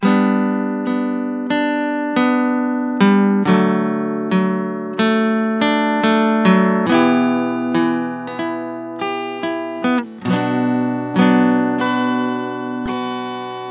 Guitar 140 BPM Dmaj; Guitar Loops; Ghosthack; Ghosthack Advent Calendar 2021

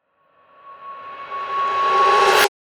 Riser

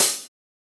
Open Hat; Drums; HiHats; Ghosthack Advent Calendar 2017 Day 5

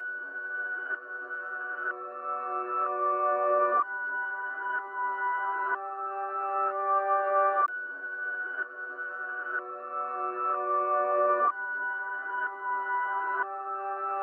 Nostalgic Melody Loop - 125 BPM B Min Reverse; Melodies; Loop Stems; Cymatics; Oracle Sample Pack

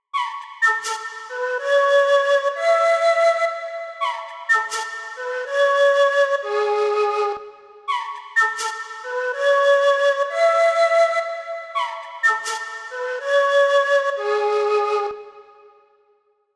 124 BPM F# Min Flute, loop stems; Cymatics; 2022 Melody Collection Platinum Expansion - Pt 2

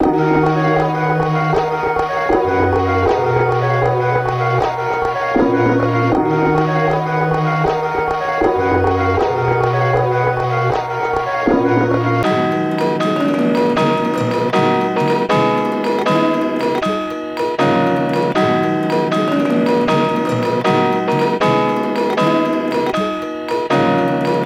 157 BPM D# Min, melody; Psychic

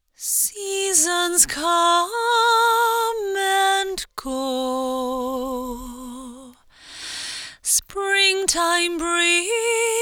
Top Line Vocals, Cm 65Bpm; Construction Kits; Ghosthack; SkyRealm; Kit 02 - Solomon Hill

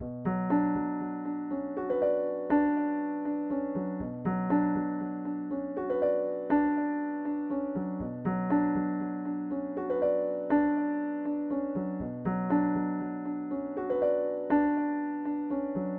120 BPM B Min Piano; Melody; Stems; Cymatics; 2022 Melody Collection (BETA); Phantom

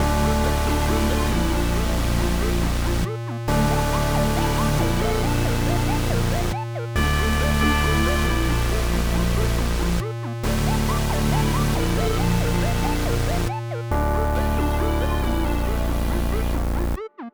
138 BPM D Min; Ray Gun; Wavy

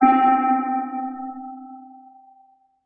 PLUCK (C), Pedal One Shots; Danish; Cymatics; ANALOG EVOLUTION Beta

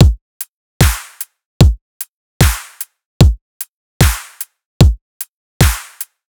Dubstep Drum Loop Full, 150bpm; Full Drum Loops (Stem Seperated); Ghosthack; Dubstep Sound Arsenal

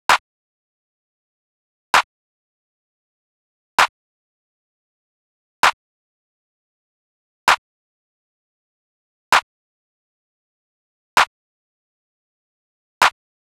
drum loop 65 BPM clap; Loop Stems; Cymatics; DOPE DRUMS VOL. 1; Peach

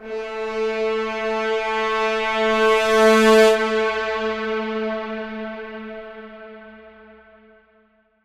Brass Swell Am 70Bpm; Construction Kits; STEMS; Ghosthack; SkyRealm; Kit 20 - Sea Of Souls

